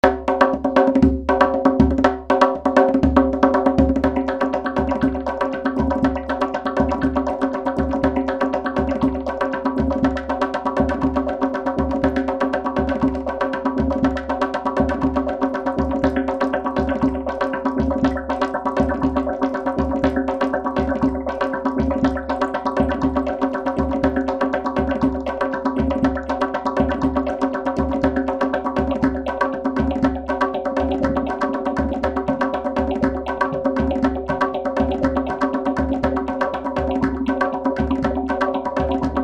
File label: Dumbek